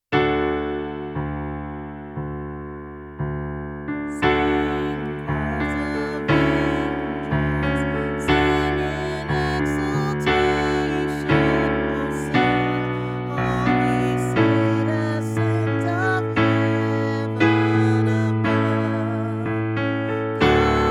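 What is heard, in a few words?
O Come We Adore You Soprano with Lead, christmas choir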